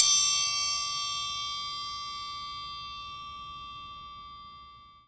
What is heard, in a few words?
PERC